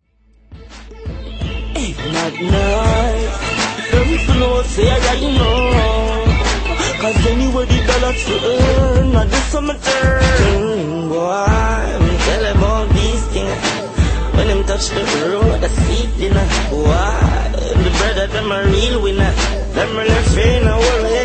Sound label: riddim, ringtones